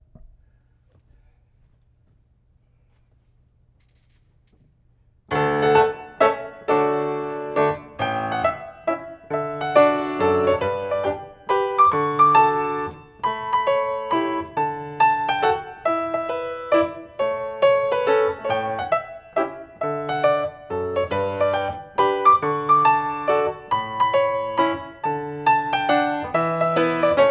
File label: mazurka light; Coppelia